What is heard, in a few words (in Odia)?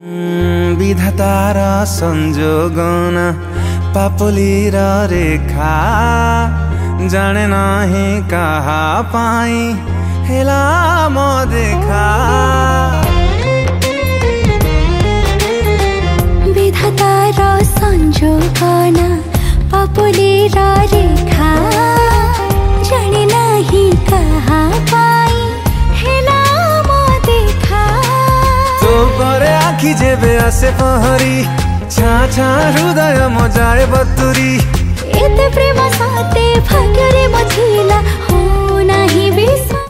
Song Odia, Odia Ringtone; dance song